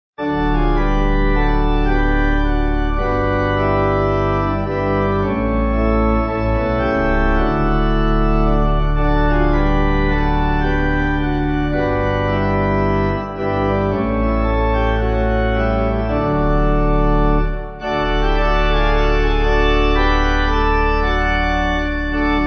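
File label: Once My Way Was Dark Pipe, Pipe Organ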